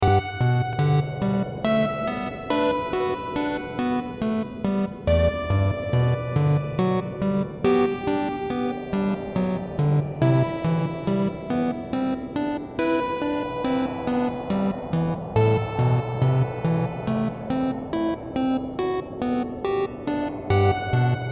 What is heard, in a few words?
bg music